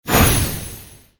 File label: Menu Custom Level Up